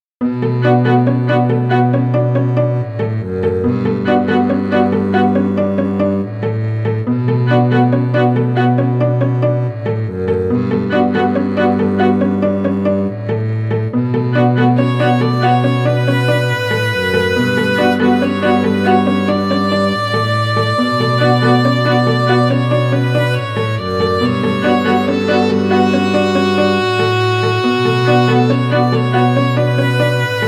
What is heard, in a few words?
string quartet